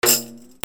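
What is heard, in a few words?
percussion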